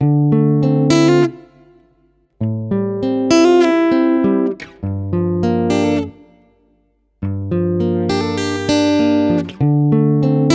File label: Guitar 100 BPM (Dmin), Guitar Loops; Cindy; Ghosthack; Guitar Loops & Shots